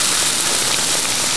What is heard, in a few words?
fry; player